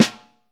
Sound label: Vintage Snare Drum, 3.5X14